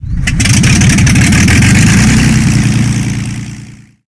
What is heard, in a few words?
zlinn start, engines; War Thunder